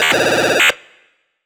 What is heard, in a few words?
game notification wave alarm; mixkit